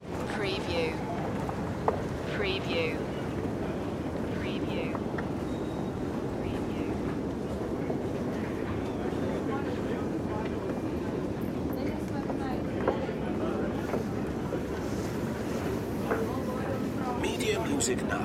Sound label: metro station moscow